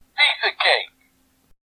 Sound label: Wiimote Sound; Falco